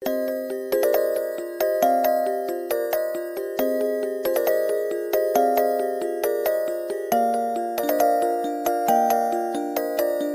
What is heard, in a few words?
ringtone